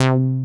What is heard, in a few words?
BASEMENT BASS; Masterbits; Dance Trax